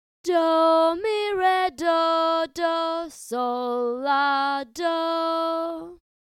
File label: solfa melody